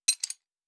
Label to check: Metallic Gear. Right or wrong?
Right